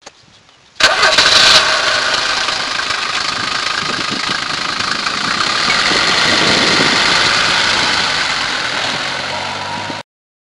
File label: diesel